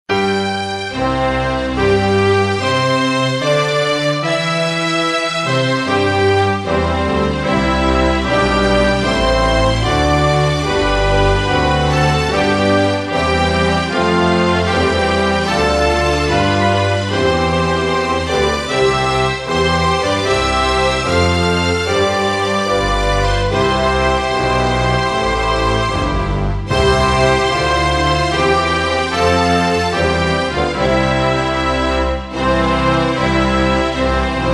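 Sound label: anthem of Saint Vincent and the Grenadines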